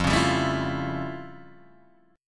F Augmented Major 9th, Guitar, Open D

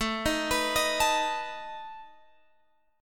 A Suspended 2nd Flat 5th A down Guitar Open G

